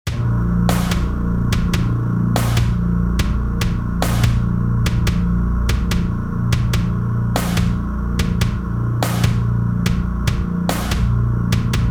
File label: Drone